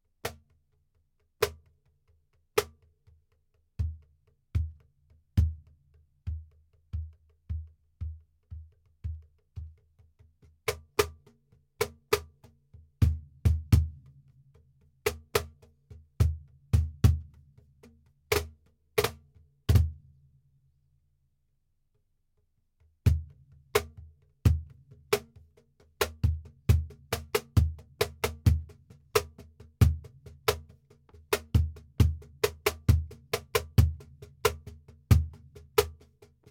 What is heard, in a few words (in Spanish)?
cajon flamenco; tapa amarilla; eclipse; personalizado